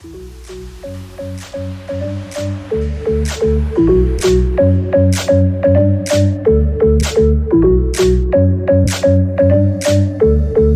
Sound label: Electronics Editz 128bpm